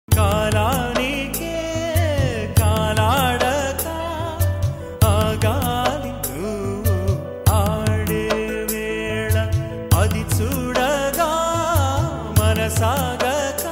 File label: telugu love songs; nagarjuna